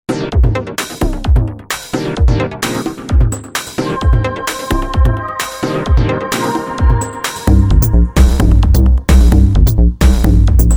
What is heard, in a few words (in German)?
Elektro Dance